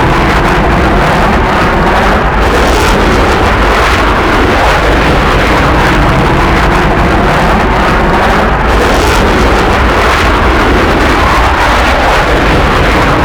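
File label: F-5E J85GE back, aircraft engine; DCS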